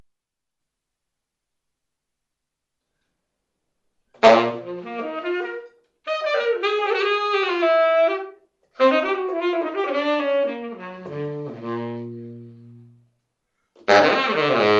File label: Bb Improvisation